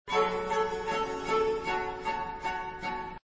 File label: G american baroque; MagnaLoops